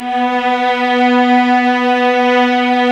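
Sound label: violas, ambience, piano; Roland L-CD702; Vlas Sect3-4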